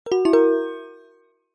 multimedia notification mallet negative with slightly harsh resonating tone; zapsplat